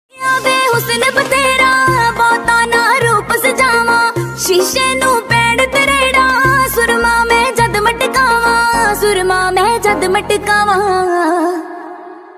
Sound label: Punjabi Tune Ringtone